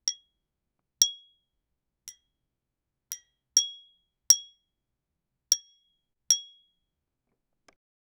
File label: trident hitting stone